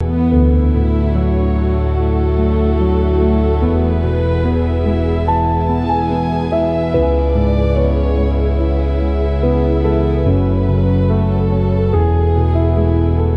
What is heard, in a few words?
loop